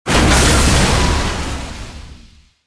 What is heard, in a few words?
fall; Star Wars Jedi Knight II Jedi Outcast; XBOX; mark1